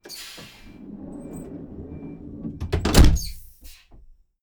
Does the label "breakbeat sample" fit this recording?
No